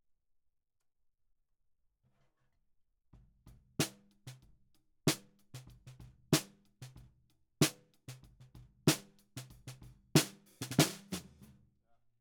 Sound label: Snare Bottom, 94bpm